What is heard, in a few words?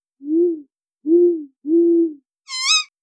owl 4 hoots; animals